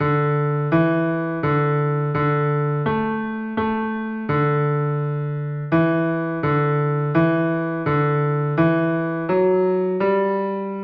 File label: cello